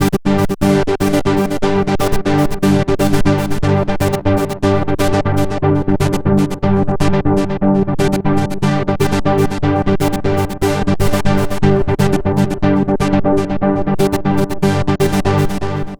Gated Pad, Deep Acid; Sonic Foundry (Sony Creative Software); Afterhours EDM

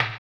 HAMBONE; Blues & Soul

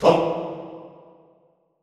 TONG E2, Extended Classical Choir; Best Service; SHORT TONG

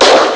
gunshot; Amiga Soundtracker Sample Packs

